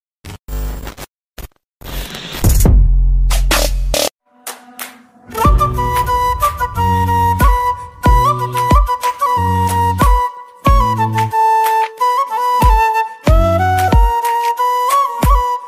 flute ringtone instrumental ringtone flute ringtone